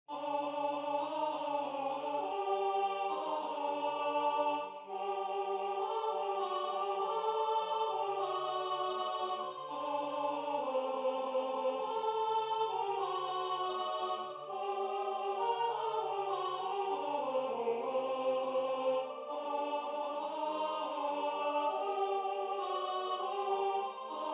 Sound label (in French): alto